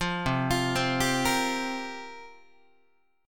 C 7th Suspended 4th, Guitar, chord; C7sus4; arpeggiated; 8,8,8,10,11,9; 4A,4E,5A,5D,5G#,6C#

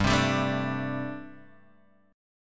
G Suspended 2nd Suspended 4th, Guitar Standard; Gsus2sus4 strummed; JGuitar

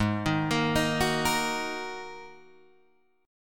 Ab 7th Suspended 2nd down Guitar DADGAD

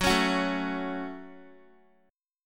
Gb Minor Guitar 4E,4A,5D,5G,5C,6F; strummed; JGuitar

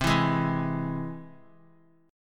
C Augmented down; strummed; JGuitar

